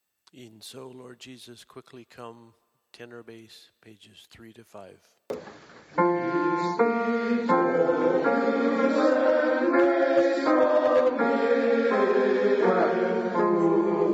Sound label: Ten-Bass w-piano